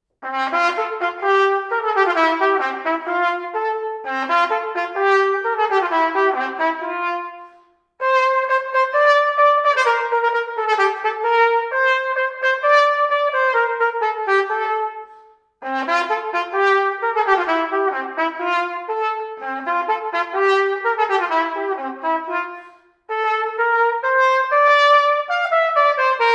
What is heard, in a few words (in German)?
Trompete